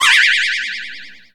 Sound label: up down, Whooshes And Transitions; Soundrangers